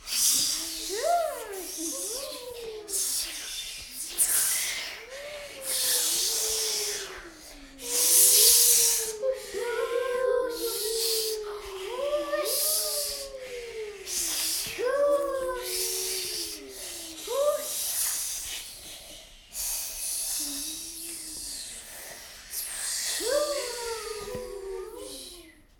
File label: Ghost Kids